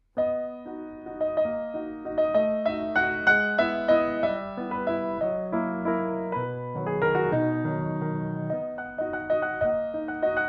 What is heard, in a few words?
Waltz